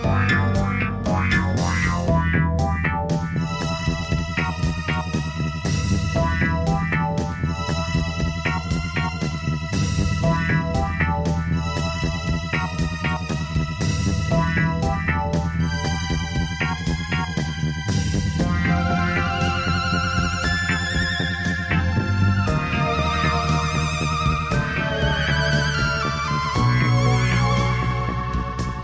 Raw Beat

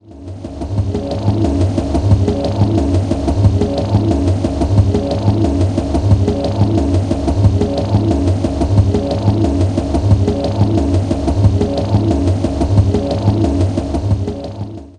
Locked Groove

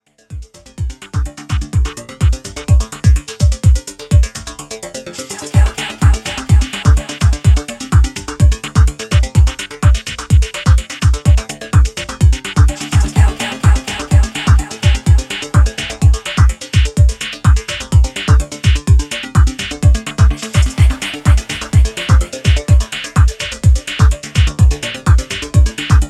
tension mix

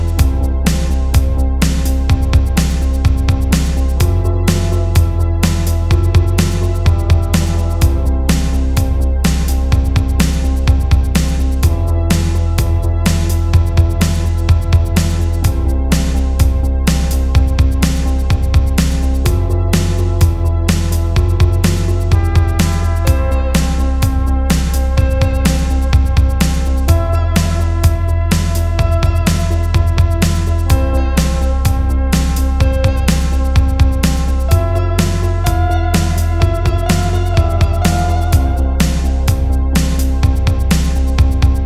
Instrumental

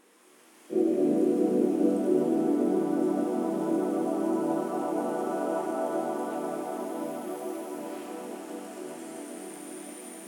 Music from Organ, Remix

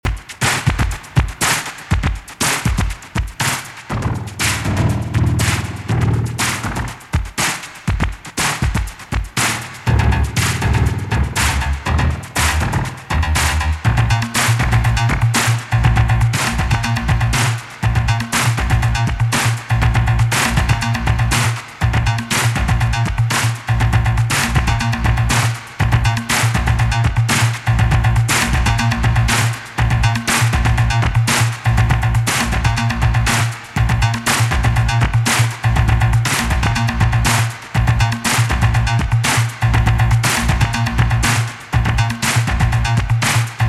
Drum Electronic Sound

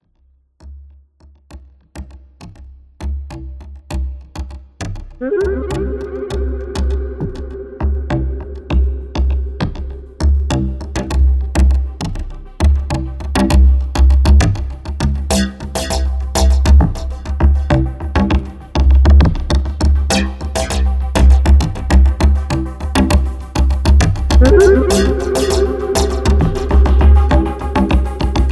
Trancer Wormhole Chant

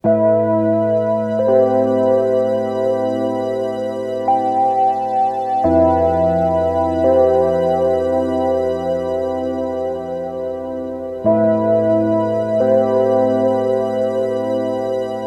unplugged alt version, ambient

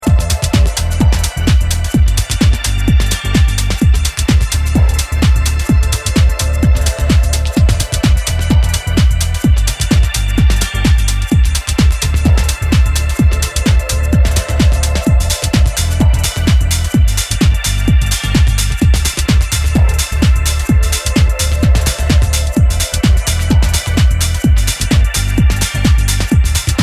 Acoustic Levitation

